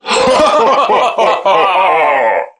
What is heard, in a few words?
madlaugh; human